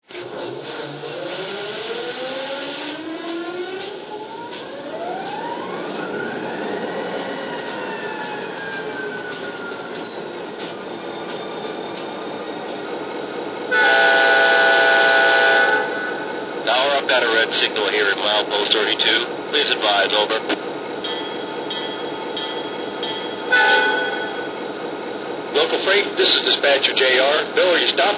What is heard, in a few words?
sd45